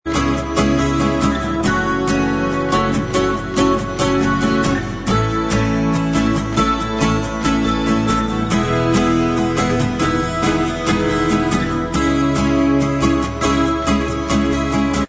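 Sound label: acoustic instrumental, backing track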